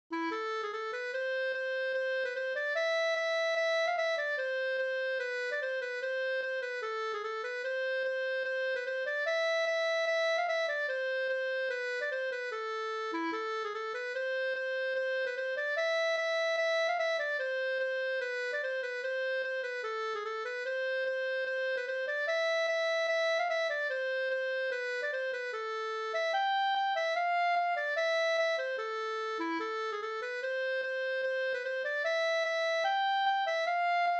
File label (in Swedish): reinlender